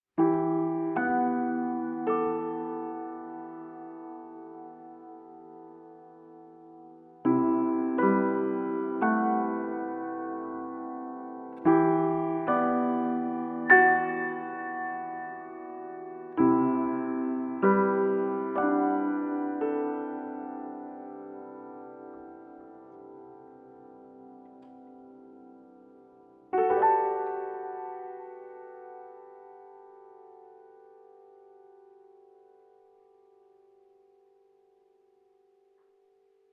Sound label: tune